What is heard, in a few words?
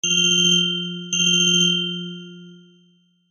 htc